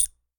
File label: Wind Chime Crunch